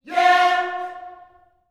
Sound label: "yeah", gospel vocal, F4; Spectrasonics; Vocal Planet Vol.1 Gospel; akai